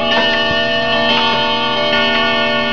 CLOCK

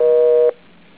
busy

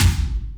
DEEP KIK

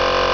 wrong

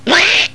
queek

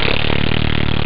thrust; mechamoid

